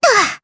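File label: Vox Landing, Japanese; Rehartna; kingsraid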